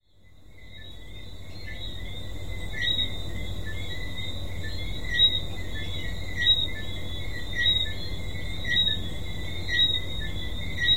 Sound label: evening sounds and other noises from barbados